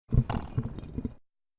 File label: rattle; scp-173